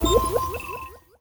Orb Heal

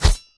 Knife Tile Hit

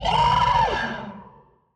scream; Dd2 betsy

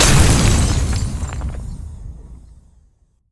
Providence Death

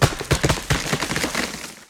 Tree Falling

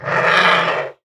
Zombie

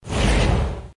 Recharge

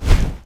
Fireball

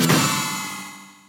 Seared Pan Smash

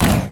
Hurt

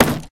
Crate Break

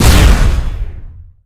Beam; Omicron

